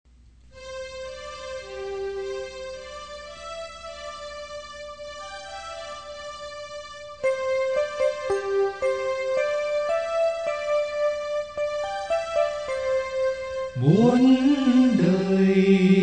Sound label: ALTO